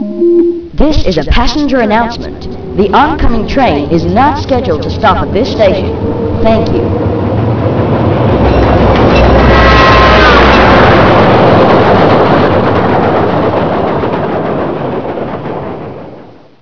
train passing

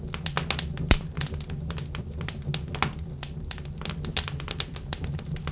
fire

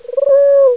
bird hospital

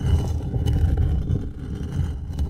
stone slab move

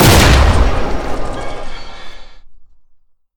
propulsion fire, weapons; deluge

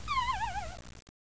death

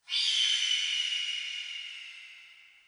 hawk scream

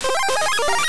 coin; goomba

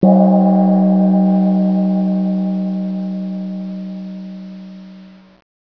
bell; bahrain

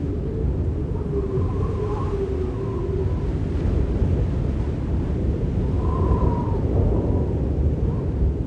silo wind loop; cs bunker goldeneye

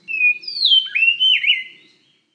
Birds, ambience

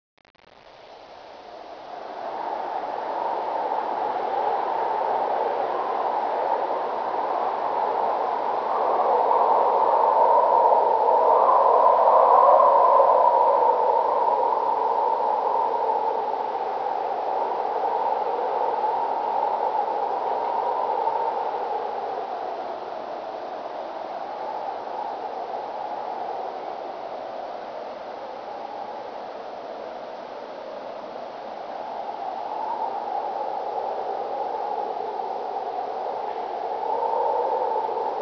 wind; kz kzdk droughthop

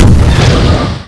rocket fire, weapons

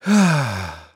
sigh

